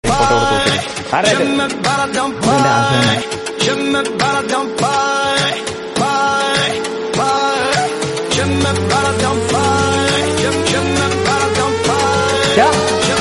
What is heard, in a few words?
music tone ringtone